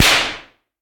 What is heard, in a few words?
slap